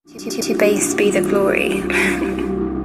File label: bass